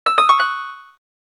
harp, keytone

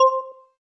shutdown

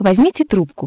menu; Hyundai H-MP718

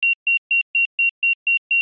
Alarm Beep; LEAGOO; M9

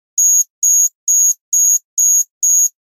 music alarm; Samsung GT-i7680; cmcc

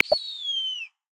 Harpy Eagle, Amazon; Xiaomi Redmi-Note-8-Pro

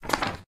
ink unlock, phonetones, ui; LG Optimus G F180K

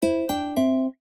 piano, notifications; ZTE Nubia-Z17-Mini

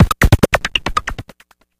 Beat Box Android, notifications; LG Vortex VS660

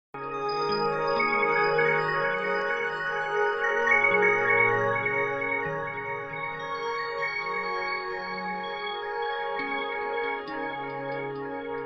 Arctic background music; Nokia N79; Audio themes

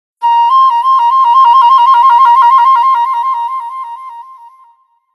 Flight, phonetones Nokia; 6700 slide

